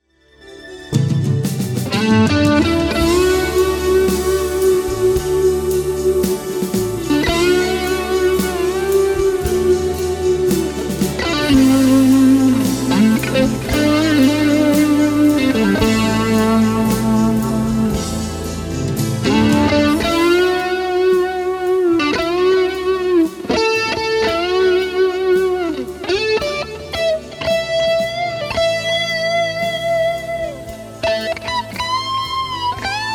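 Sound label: Solo 59 Bassman, guitar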